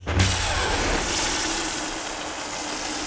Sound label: door Stab; impacts explos; quiGon